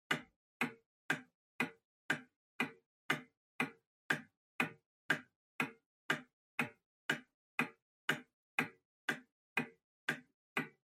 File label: Pendulum Clock Hardware Tick